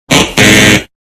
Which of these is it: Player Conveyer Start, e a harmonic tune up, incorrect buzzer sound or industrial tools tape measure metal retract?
incorrect buzzer sound